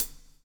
BLUES TK, Backbeat; Spectrasonics - Backbeat

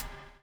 BUBL; Spectrasonics - Backbeat

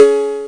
BELL; USB Soundscan vol.27; Ragga & Dub Reggae; AKAI; BELLS KIT

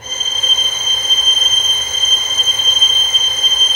STR VLS, STRINGS; Roland - LA Composer vol. 1

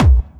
KIT HOU; Best Service Dance Mega Drums

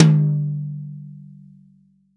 TOM, Real Drum Kit, SWING KIT

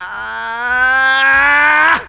VOX; Zero G; Man Machine

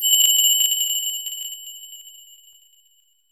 wind bell, FM synth; AKAI S-Series CD-ROM Sound Library VOL-6

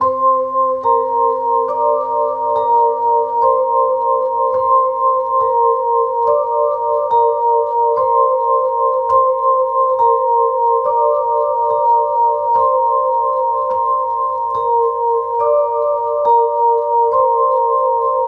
105 Cmaj SUGARFUNK VIBES; 105 BPM

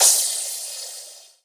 Crash, Drums Cymbal

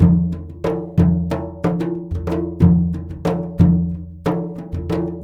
MLY DRMS; Spectrasonics - Heart of Asia CD2

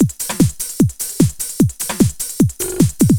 LOOP, Eurotech; Sounds Good

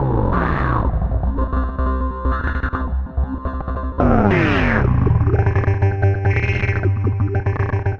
Granular Synth, Synth SFX; Sonic Foundry (Sony Creative Software); The Electronic Music Manuscript Collection

